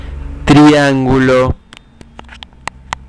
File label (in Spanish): triangulo